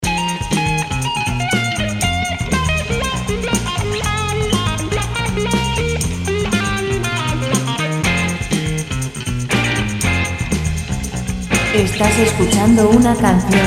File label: 120 F rock